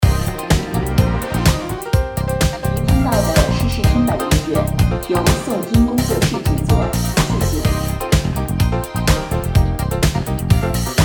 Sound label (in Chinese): 德州 游戏中 紧张, bgm; 《斗雀》